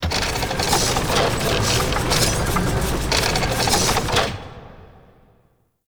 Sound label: Asteroid Misc Machines; Ambience; Warframe; Grineer